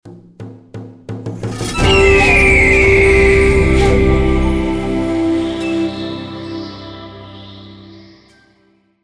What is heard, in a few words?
bonus Prize you Won Reveal eagle; Shamans Dream 2